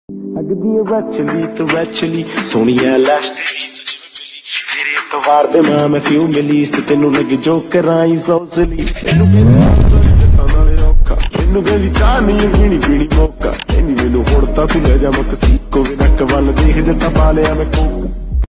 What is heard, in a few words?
slowed punjabi rap ringtone